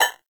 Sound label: Hack Minigame Tick; Entrati